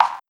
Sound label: Soft Kick; Esoterik Beatz; PH7 Kit